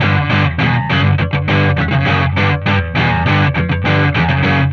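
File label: Distortion Riff Guitar; Guitars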